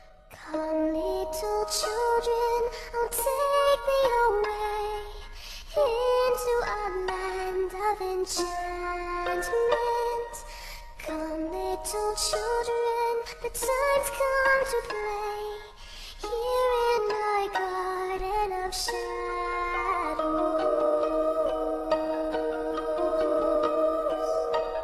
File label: nightcore